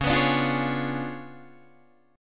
C sharp 7th Flat 9th Guitar Open D, chord

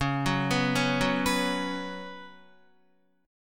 Db 7th Suspended 4th Db down Guitar; arpeggiated

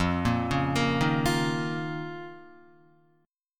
F 7th Suspended 4th Sharp 5th F down Guitar; F7sus4#5; arpeggiated; JGuitar

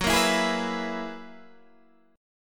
Gb 11th, Guitar, Open D

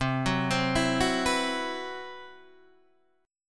C Major 7th Flat 5th C, Guitar Standard; CM7b5 arpeggiated; JGuitar

